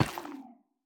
幽匿催发体踩踏声

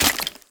脚步声; 沼骸

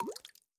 滴水石锥水滴入炼药锅声

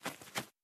鹦鹉飞行声

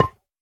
骨块破坏声

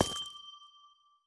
紫水晶踩踏声

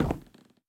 錾制书架 踩踏声

